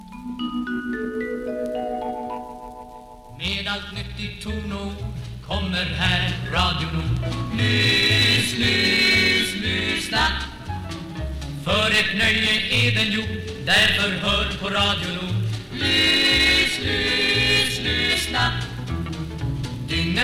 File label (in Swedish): Radio Nord signatur